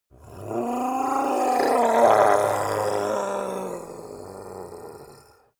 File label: monster dark growl; kreaturen